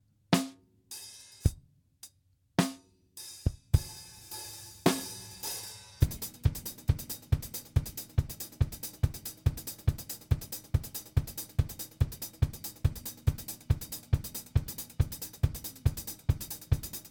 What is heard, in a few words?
Drum Test Clean